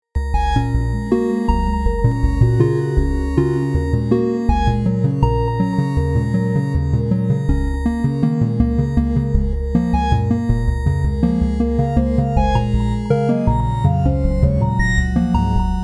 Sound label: Sonification UTR to Surface Glycoprotein